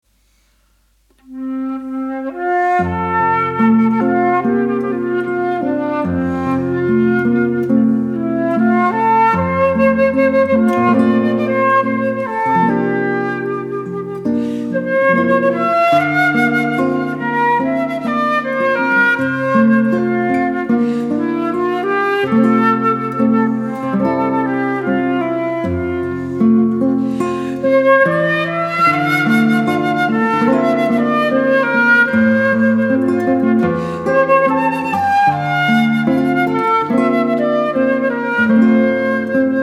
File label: Flute Guitar